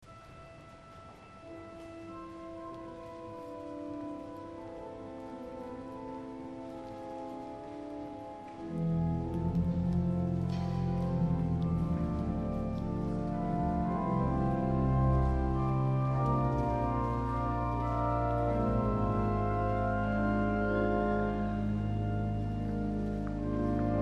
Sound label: Organ interlude